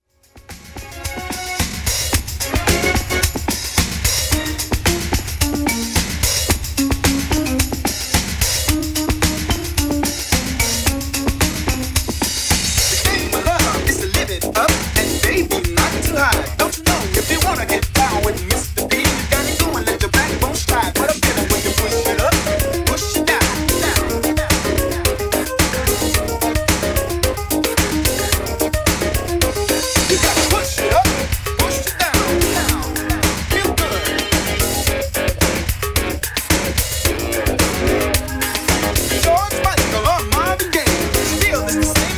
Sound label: Classic Mix 110 Bpm; djyan